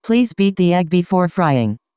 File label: beat